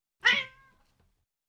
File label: Cat Tail Stepped On; Cats; BBC Sound Effects Library